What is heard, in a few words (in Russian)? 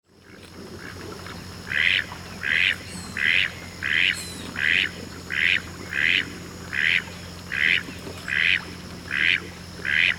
zvuki glazchatoi kriakvy